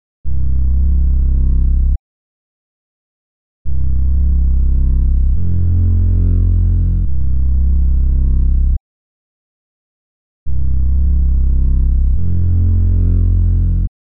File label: 141 BPM C Min Bass, Drill; Cymatics; Red Eyes; 2022 Melody Collection